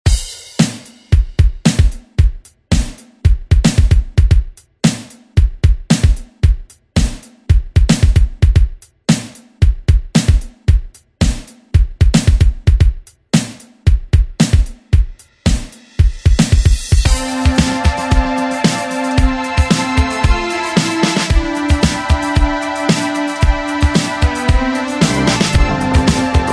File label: 80s ReDrum; DJ Mhark